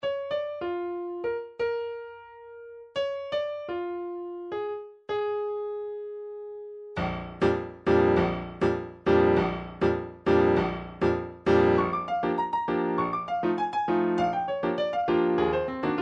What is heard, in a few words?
prelude allegro ben ritmato e deciso, midi